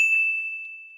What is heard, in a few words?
ding